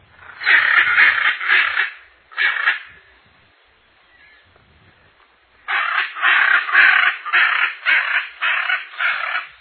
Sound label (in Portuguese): arara-azul-grande